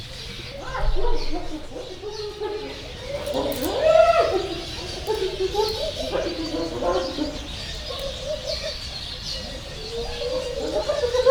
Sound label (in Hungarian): fehérkezű gibbon helyett, foltos hiéna; jaszberenyi zoo 2015